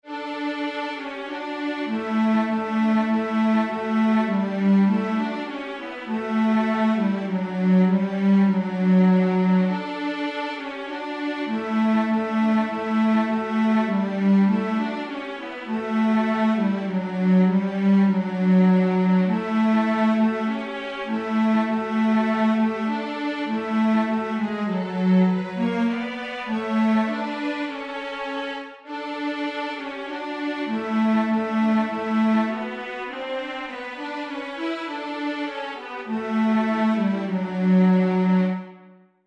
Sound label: tenor; Deck the Hall